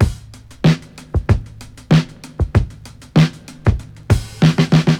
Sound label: 96 bpm drum groove D key, Free drum beat - kick tuned to the D note. Loudest frequency: 595Hz